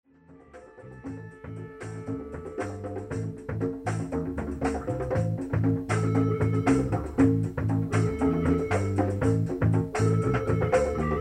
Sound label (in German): Bass Solo